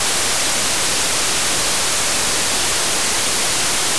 noise bgm; ゆめ2っき

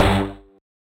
marimba steeldrum; ゆめ2っき

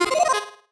marimba dice; ゆめ2っき

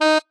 marimba bat

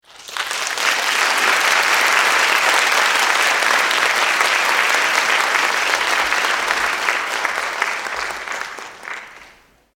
Clapping short

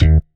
marimba; ゆめ2っき

